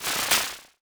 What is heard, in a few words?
Transition Hit Impact Short; Production Element